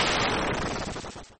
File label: Explosion chiptone